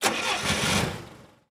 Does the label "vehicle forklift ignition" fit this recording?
Yes